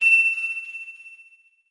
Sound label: SE 2D Gamestart; 774 deaths